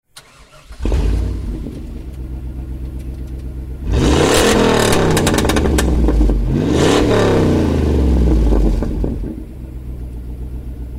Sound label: Vehicle